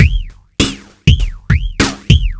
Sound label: drloop, drumloops, Vinyl Attack; Masterbits